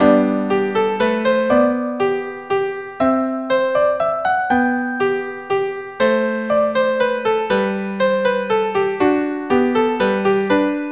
Minuet In G

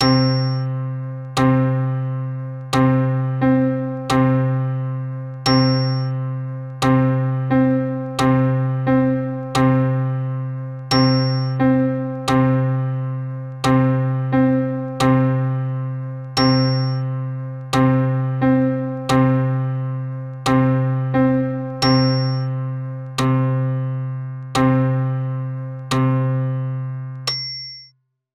Rhythm Exercises eighth notes

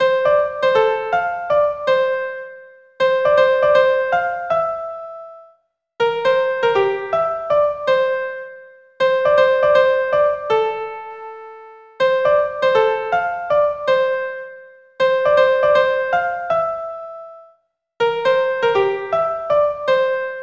melody

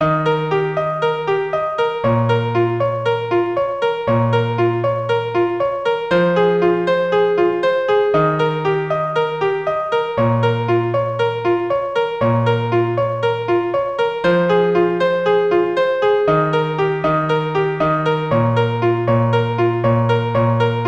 piano arrangement

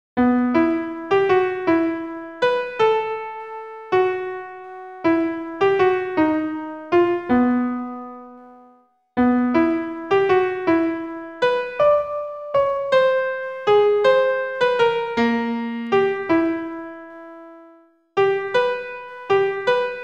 melody

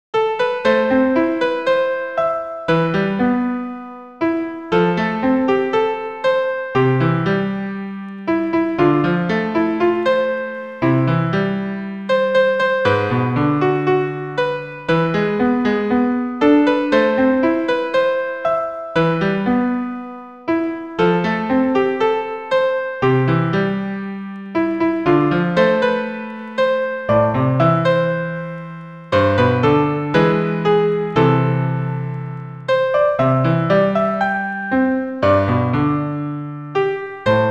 piano arrangement

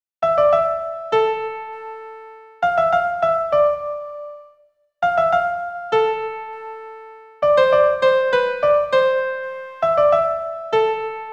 in C

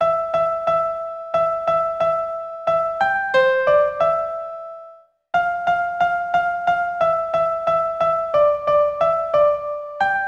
simple rhythm; Jingle Bells

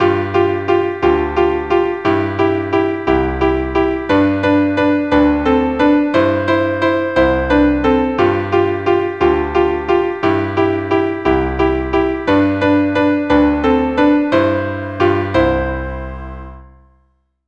Chopsticks, first part, 88 bpm

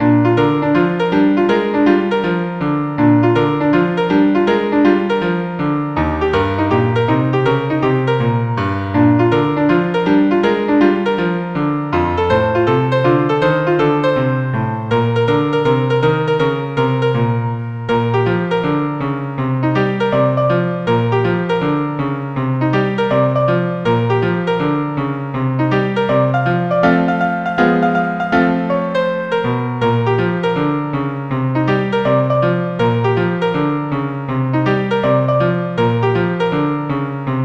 piano arrangement

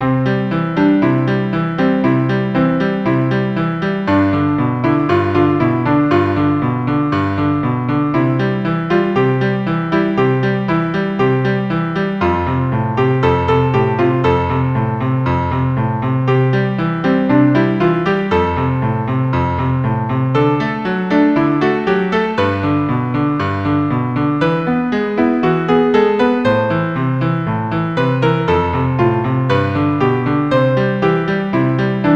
Alberti Bass

